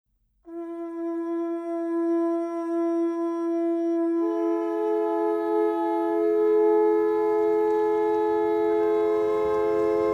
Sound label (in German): Muschelhoerner